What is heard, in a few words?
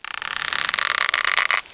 stretch; weapons; ProjectMobius